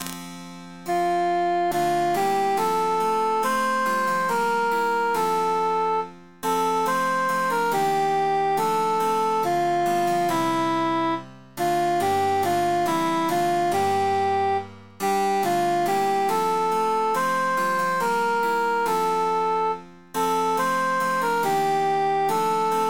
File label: cantus emphasized; innsbruck